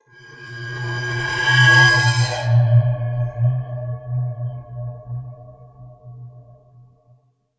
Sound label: spooky